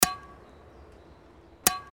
badminton hitting

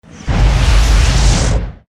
agressive swoshes short

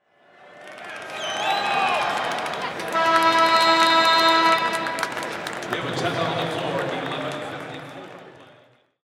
basketball horn and whistle

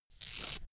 velcro open cap quick

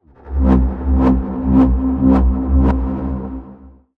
heli whobber

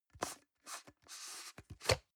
plastic can small lid screw open medium

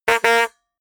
notification honk

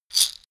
shaker wood hit single hard

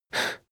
male breath fast in

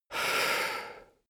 male breath medium out

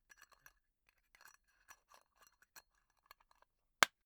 tobacco tin close unsteady long

